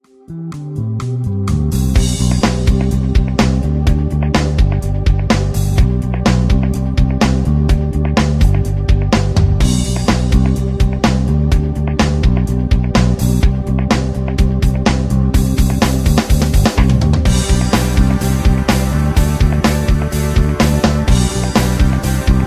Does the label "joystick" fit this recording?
No